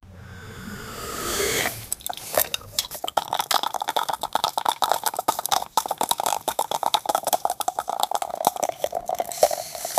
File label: potion drink sound, bottle